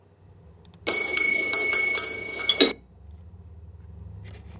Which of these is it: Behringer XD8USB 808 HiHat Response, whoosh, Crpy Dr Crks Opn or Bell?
Bell